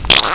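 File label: squirt beep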